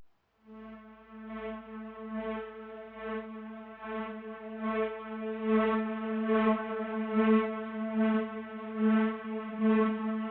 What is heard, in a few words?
144 BPM A Min Pad; Trap; Melodies; Loop Stems; Cymatics; Trap Starter Pack; Betrayed